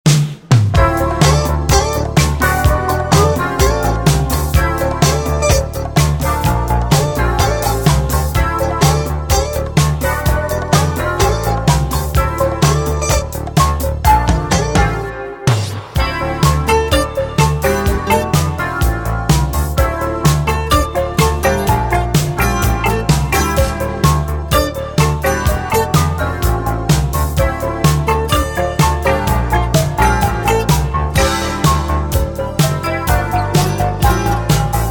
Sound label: Metal Bass